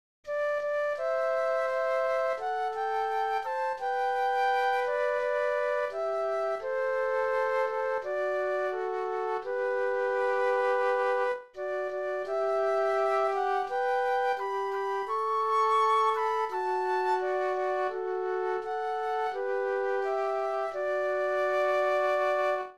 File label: Flute Duet; christmas